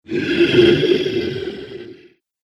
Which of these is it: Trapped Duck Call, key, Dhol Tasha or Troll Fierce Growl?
Troll Fierce Growl